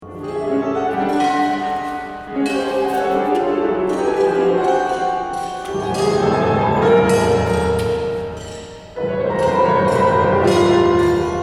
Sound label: for chamber ensemble